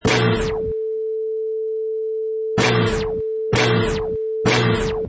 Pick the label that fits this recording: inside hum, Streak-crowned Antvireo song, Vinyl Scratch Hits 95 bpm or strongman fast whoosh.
Vinyl Scratch Hits 95 bpm